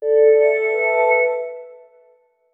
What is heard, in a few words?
new notification for a chat